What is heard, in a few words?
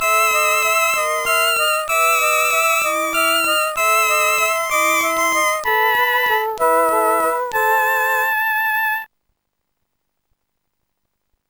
village; Sonix Music Driver